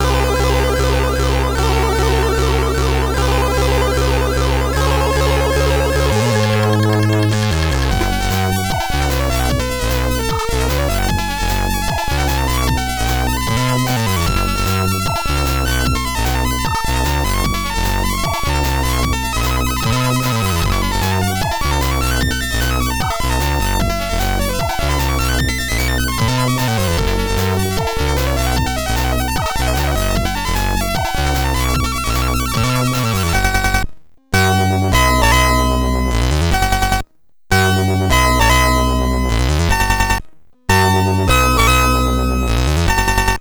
SID Stereo; Sly Spy Secret Agent Commodore 64; Stage 10 Rocket Silo

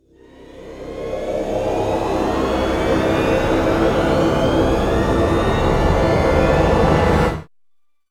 Door Breach; medal of honor warfighter

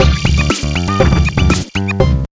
Cleared; the urbz sims in the city; gba; Roadblock

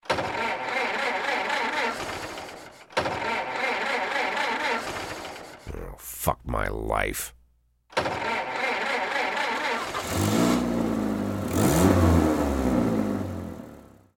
Start the Car; This Is the Police

